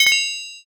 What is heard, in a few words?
counter